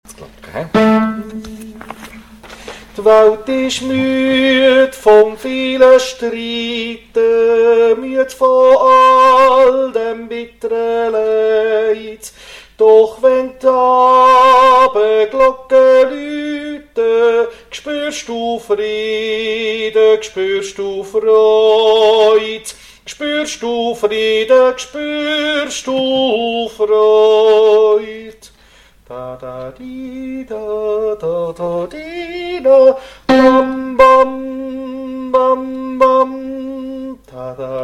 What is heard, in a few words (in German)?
Tenor